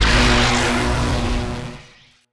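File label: Laser Fire Interior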